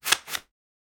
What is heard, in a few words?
single short sound of the, card games